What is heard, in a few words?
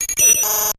Distorted Beeper